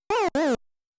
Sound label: Zako Stricken; galaga